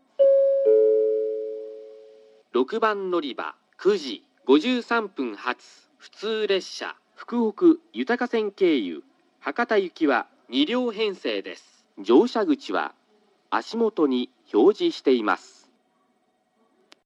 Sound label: local Hakata; kokura